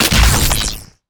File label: Chain Lightning Impact; Evolve; Elder Kraken